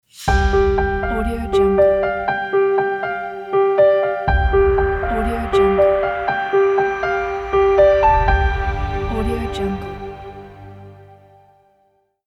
air piano ident

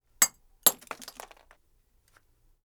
split glass

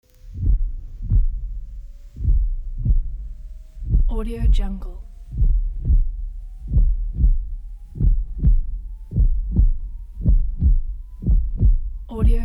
accelerating heartbeat

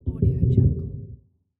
underwater metallic rumble

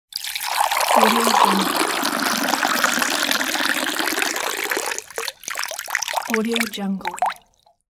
milk poured into a carafe

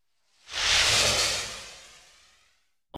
zoom by scifi fast whooshes sharp airy hissing

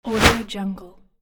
whoosh movement

